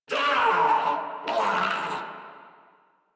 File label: Death Crewmen; Warframe; Corpus Crewmen; Crewman D